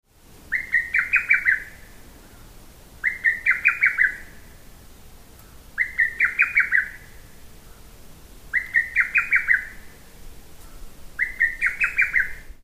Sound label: hototogisu call; yanbaru